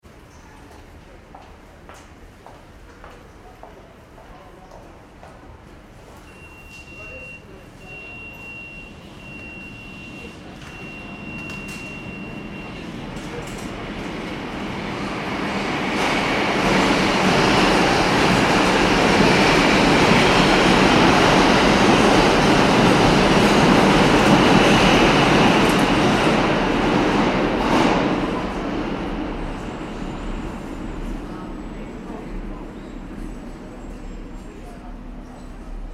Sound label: ambience train station subway train non stop people; Subway Train Station ( New York City ) Ambience - Train Runs Through Station Without Stopp; Subways; Vehicles; McKinney Sound